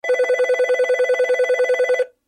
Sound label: Nortel Vista Ring; meloboom